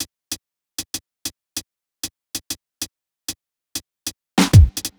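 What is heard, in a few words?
Drums, Hip Hop Exotica, 096 A minor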